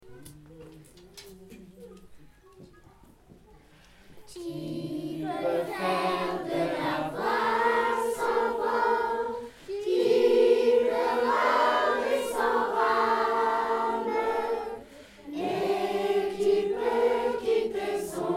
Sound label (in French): Chorale; Chorale Auberbabel; Qui peut faire de la voile sans vent